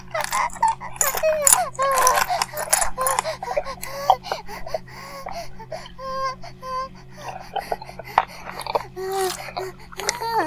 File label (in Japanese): バイブ放置, ASMR